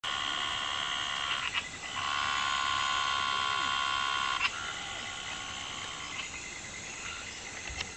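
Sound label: Scinax jolyi, frog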